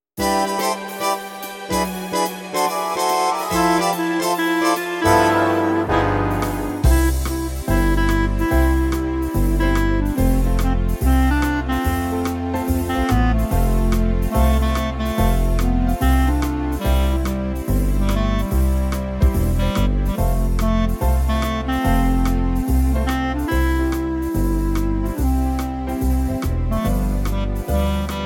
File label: big band